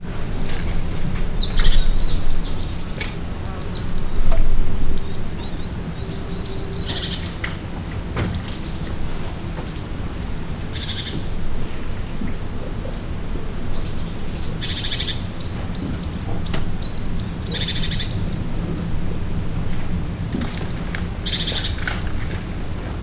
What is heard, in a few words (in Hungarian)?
a közeli baloldali hang egy széncinege cserregése egerturistaház; allathangok; termeszetben